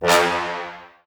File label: Impact; 7rystan's 600+ FREE EDM SAMPLES & DRUMS; SFX